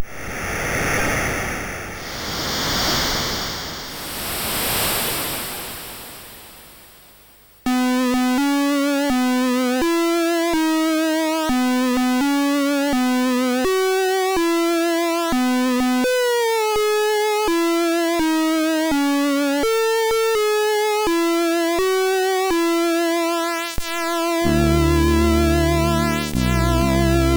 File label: Happy Birthday sid MOS6581R4; Bluez